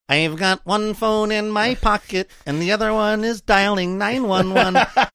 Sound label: singing